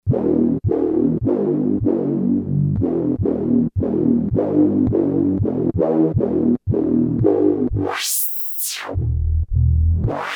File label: Square Bass, Novation XioSynth; Novation XioSynth 25